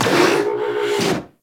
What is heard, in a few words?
Repair Robot Rotate; Venus Open World; Objective Rooms